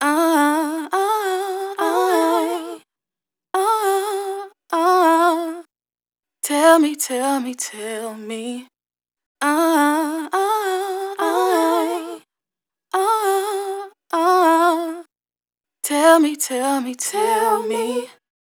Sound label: Vocal Phrase Tell Me - 102 BPM E Min Dry; Vocals; Cymatics; ZODIAC Beta Pack